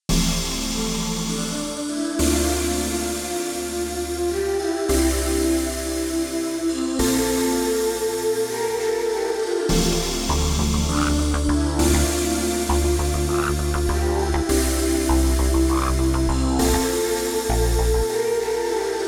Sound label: SQ80 V; Arturia V Collection 9